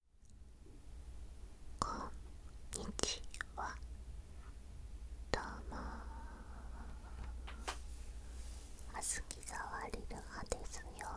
麺ずるずる, ASMR; ご挨拶

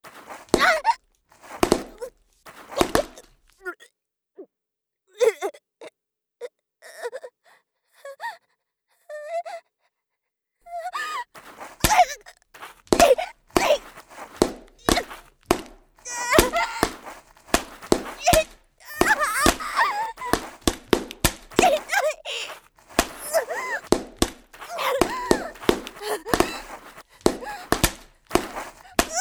沢山棒で叩く, 効果音あり

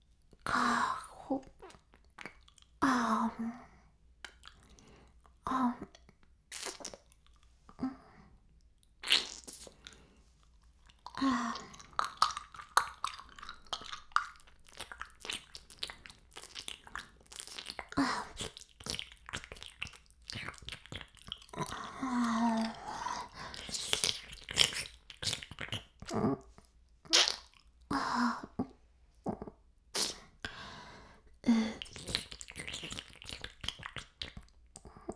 耳舐め音声; 白くて黒い魔性円環

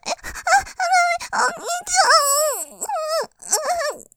絶頂前スパート・激しく絶頂・お兄ちゃん！！！; ループで楽しむ！シェフの気まぐれ抜き抜きコース

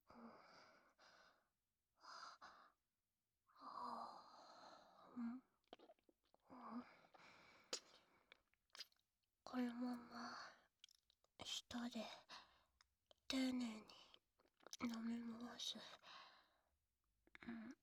お掃除フェラ

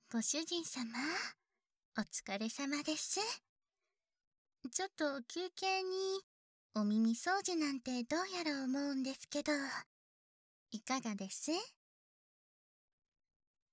耳かき 綿棒, 関西方言; 耳かきでお耳をすっきり（主に綿棒）; メイドさん

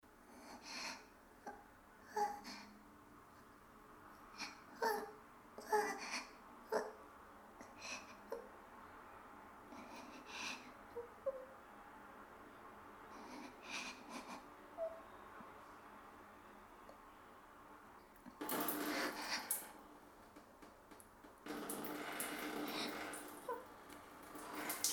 わんわん お風呂場 足上げ おしっこ; メス犬奴隷; 別バージョン

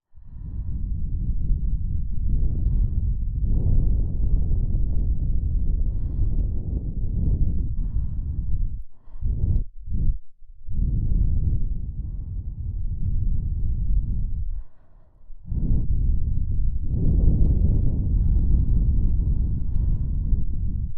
耳に息を吹きかける音 両耳同時, ASMR

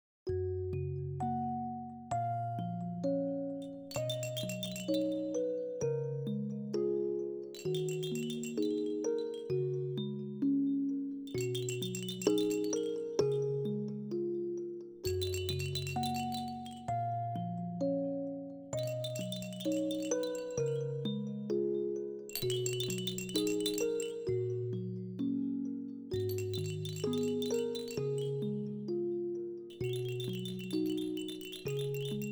ふわふわ メリー と 優しい ガラガラ で おやすみなさい。ボイス OFF; ボーナストラック；ママの寝かしつけ

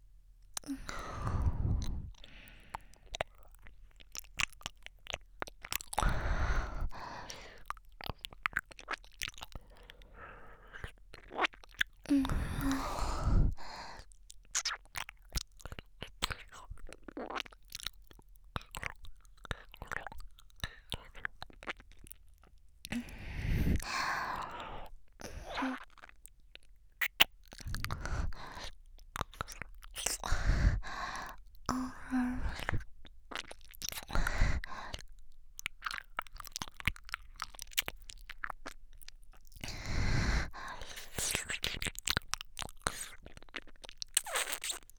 ねっとりゆっくりれろれろ耳舐め

